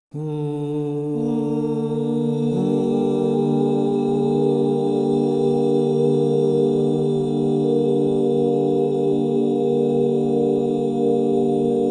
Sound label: Sol minor, crystal bowls; Yoga and sound